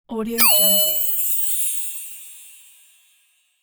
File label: magic sfx